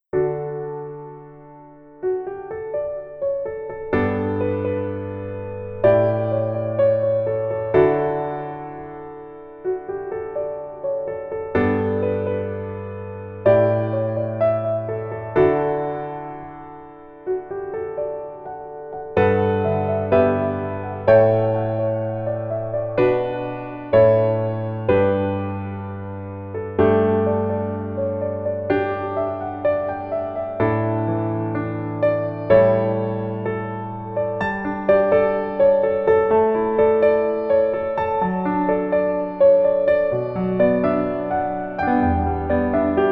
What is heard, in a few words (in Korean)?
Piano ver 멜로디MR 여자키